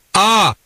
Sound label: pain; freetv